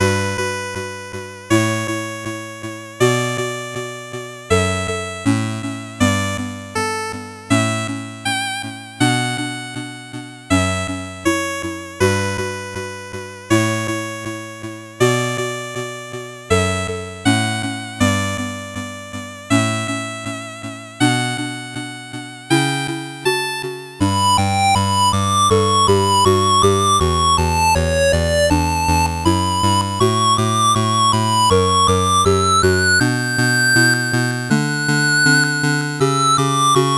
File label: 8bit bgm slow